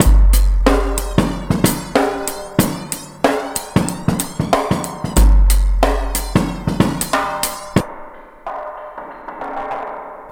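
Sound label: drum, Breakbeat; Best Service ProSamples vol.24; AKAI; ONE HAND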